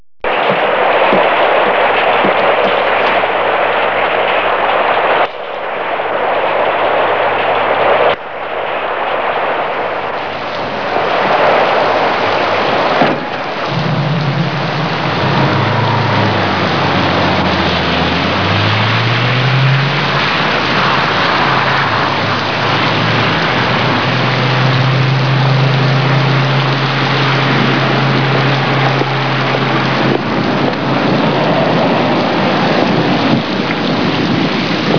Rain, 雨声; 特酷声音素材库

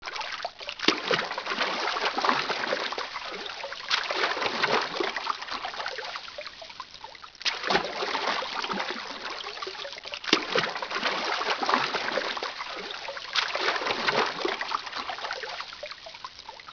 Water, 水声; 特酷声音素材库

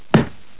Fight; 特酷声音素材库

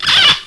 Cartoon; 特酷声音素材库

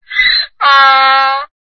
Donkey; 暴力赛车

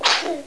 Insect; 特酷声音素材库

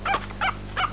DOG, 狗; 特酷声音素材库

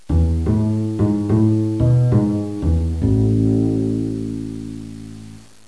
Joy, happymusic; 特酷声音素材库; 背景音乐

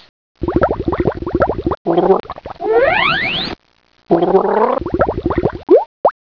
Water, 水声; 自然; 特酷声音素材库

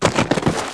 Bird; 特酷声音素材库

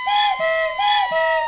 Bird; 特酷声音素材库

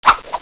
Fight; 特酷声音素材库

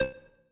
basic arcade hi ping, button and menu sounds; 18 button and menu sounds

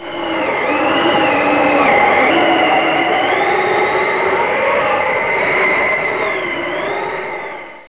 Wind; 特酷声音素材库; 自然; 风声

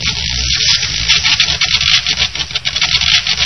Tram spark loop; 暴力赛车

